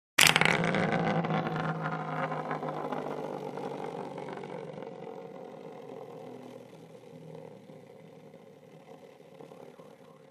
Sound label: Toys, Whipping-Top, Rotations x3